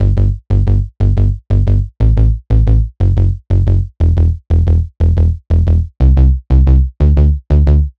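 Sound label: Adventurous Melody Punchy Bass G major 120BPM; Ghosthack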